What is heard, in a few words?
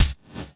BD, 160 BPM; Ueberschall; Drum & Bass Flash Trackz